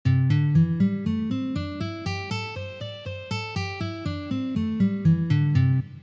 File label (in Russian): Minornaya pentatonika 1 poz